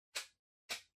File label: Lighter (Far-Flick)